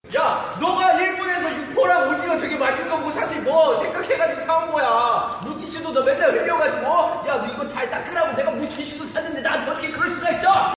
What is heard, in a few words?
bgm english